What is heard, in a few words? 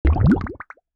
Bubbles Water Mud